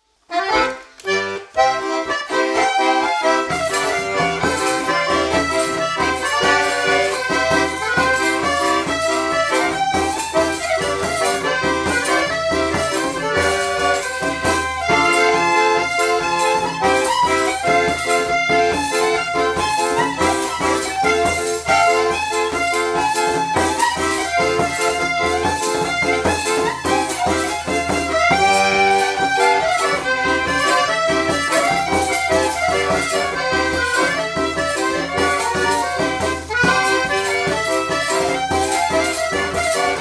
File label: Wiasielny marsh; Stachawa